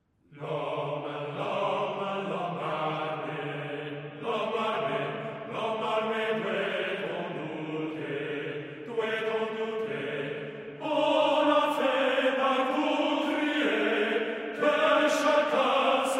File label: L'homme arme Phrygian mode